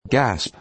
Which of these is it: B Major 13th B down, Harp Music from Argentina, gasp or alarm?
gasp